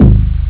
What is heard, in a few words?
pick bass; jazz jackrabbit 2